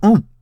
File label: Chorus hurt